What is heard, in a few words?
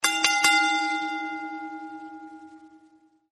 ring bell; wwe